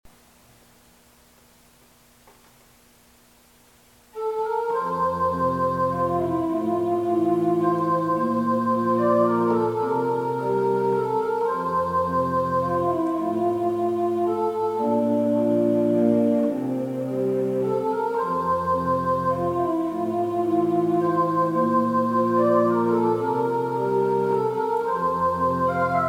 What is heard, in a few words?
Waltz; Butterfly